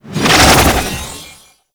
Archwing Prime Sword Whoosh; Warframe

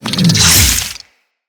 Infested Big Ashen Melee Swing B, enemies

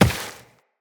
Step Rock, Footsteps Walk; Characters; Tenno

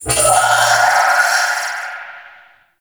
Guardian Shield Pulse; Warframe; Eximus

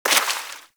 Bullet Impact Debris Water; Warframe; Weapons; Bullet Impacts

